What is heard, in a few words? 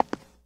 concrete step; Golden Crayon Sounds